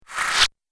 Laser Suck; multimedia

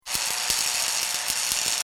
Arc Welding Spark Sounds; Construction Sound Effects

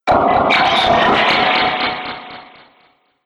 Science Fiction Laser Beam Metal

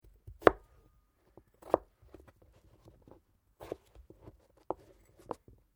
POTATO SLICE; Various food preparation; Food Prep (Sound Effects:Restaurants)

